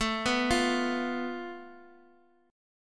A Suspended 2nd Sharp 5th

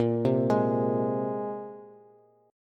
Bb 7th Suspended 2nd Bb Bass, chord; x,13,10,13; Bb7sus2 arpeggiated; JGuitar

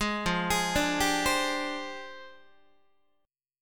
F Minor 6th Add 9th F down Guitar; JGuitar

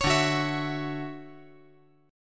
D-Major 9th D down

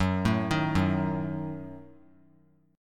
F sharp Minor 6th F sharp; arpeggiated; JGuitar

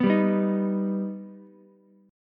B Augmented B Ukulele, chord; strummed; JGuitar

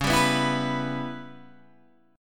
Db Minor 7th Flat 5th Db down Guitar Open G

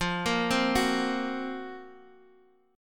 F sharp Major 7th Flat 5th F

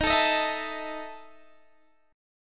E Major 7th Suspended 4th Eb, chord

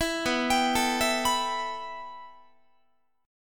B 7th Suspended 4th Sharp 5th B down Guitar; B7sus4#5; arpeggiated; 19,19,22,19,20,22; 4A,4E,5A,5D,5G#,6C#

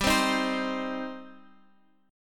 G Suspended 4th Sharp 5th, Guitar, Open F; Gsus4#5 strummed; JGuitar

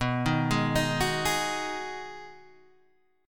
B 6th B down Guitar Open D

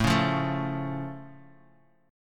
A Augmented Guitar; strummed; JGuitar; 4,4,5,2,x,x

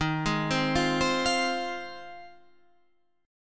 F Minor 7th D sharp

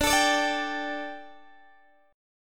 D Suspended 4th D Guitar DADGAD

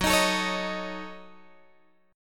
Gb Minor 6th Gb down Guitar Open D

